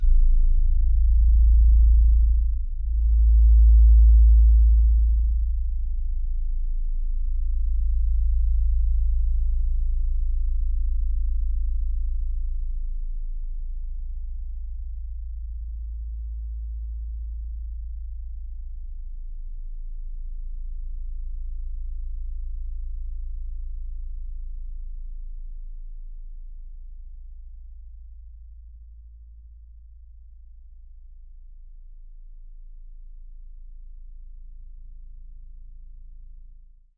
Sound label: LOW A#1; Q Up Arts; Denny Jaeger Private Collection